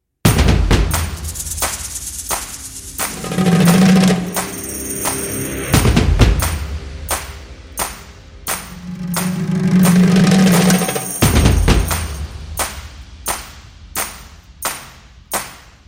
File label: Dance Beat